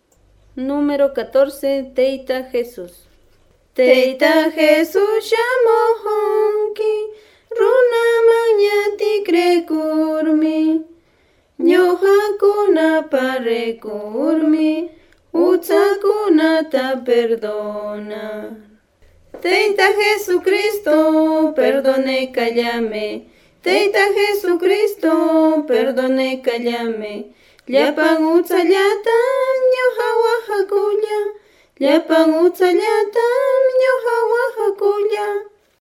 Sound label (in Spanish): cancion